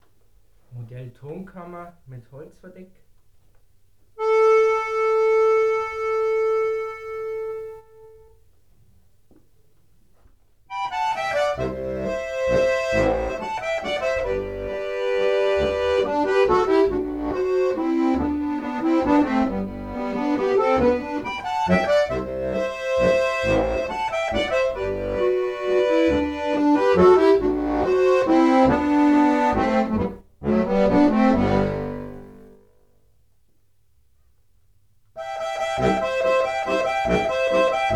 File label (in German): Tremolos, Tonkammer mit Holzverdeck